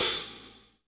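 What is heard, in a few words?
Reg. open hi hat, Roland BK-5 hi hats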